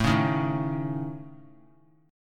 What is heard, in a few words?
A Major Flat 5th A, chord; AMb5; strummed; JGuitar